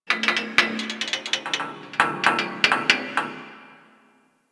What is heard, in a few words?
Ocean Medium Metal Creaks; Ambience; Warframe; Grineer Ocean